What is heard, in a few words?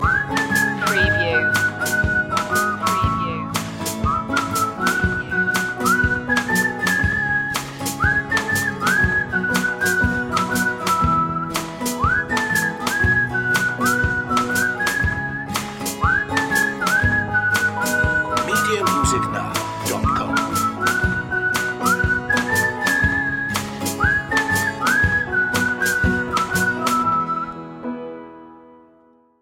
whistling